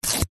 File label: leisure playing card rip; zapsplat